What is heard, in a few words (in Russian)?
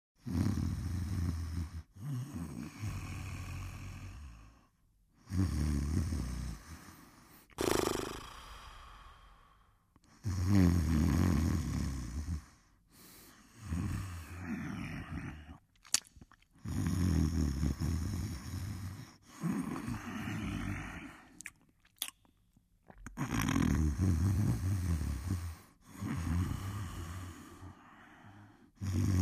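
человек храпит сопит чавкает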